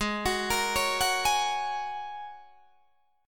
G sharp 7th Suspended 2nd Suspended 4th G sharp Guitar DADGAD